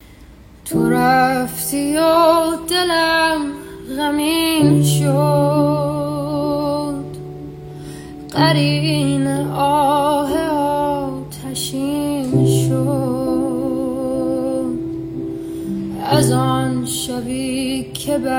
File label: piano